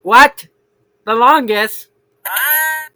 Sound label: the longest aaaaaaah